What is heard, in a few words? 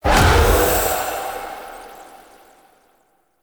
Rage Impact; Powers; Fey; Founders Champion